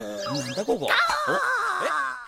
Rawr

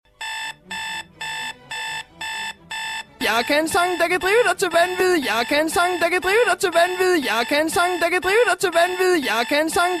Annoying Alarm Tone

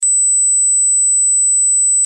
Mosquito

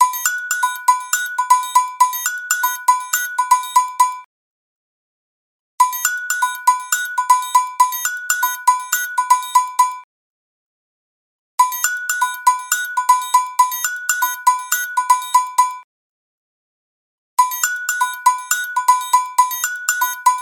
Rhythm on a Cowbell

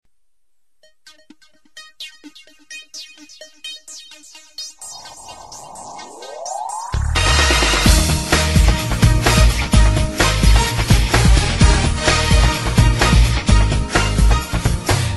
Music

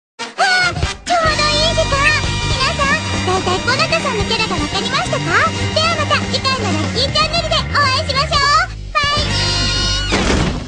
Sms

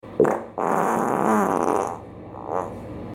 Angry Dog

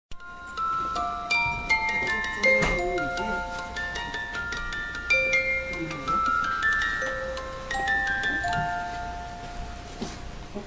Clock Sms; Totoro

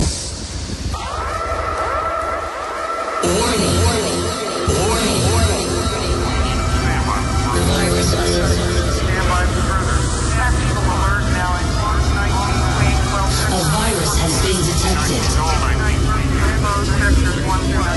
Warning

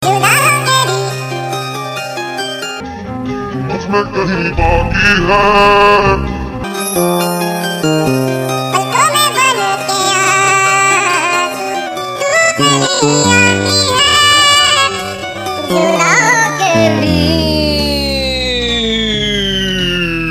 Funny Song

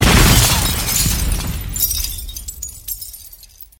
Boom And Glass Break

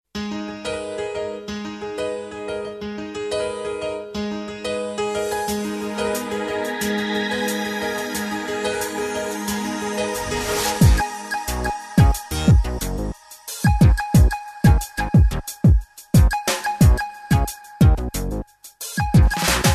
Hop da electro

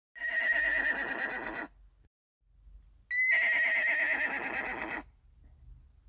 horse; Ellie

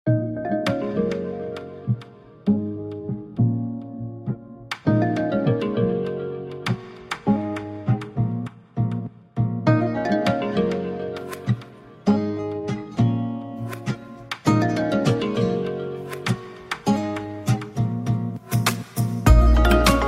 Pop Intro

